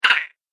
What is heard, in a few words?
Night Gremlin, Monster; horror; Monster Sound Effects